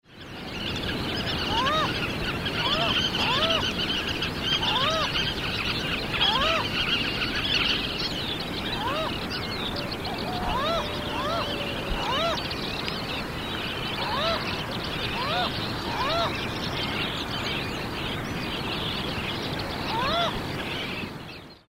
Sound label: audouins gull